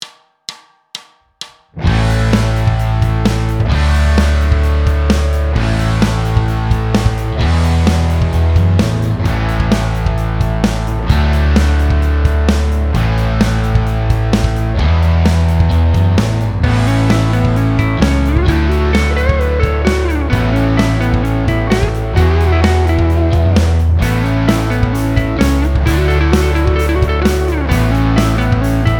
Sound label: power chords A D E